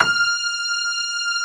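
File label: grand piano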